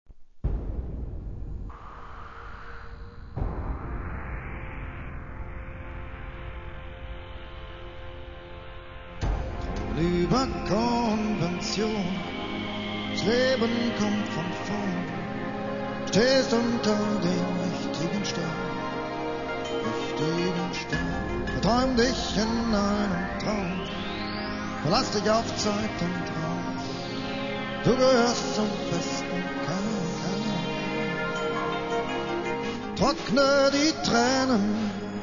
baa ambient version

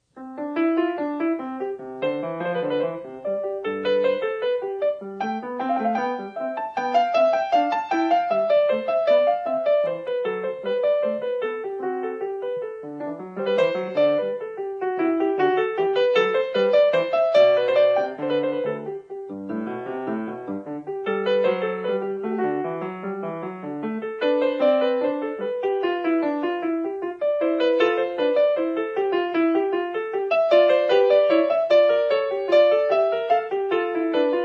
Invention No 1 in C major

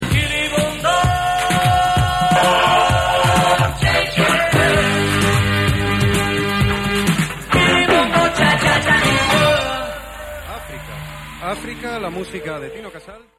african chic

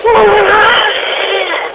SCREAM; evp

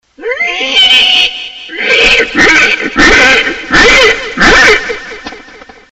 Weird Sound

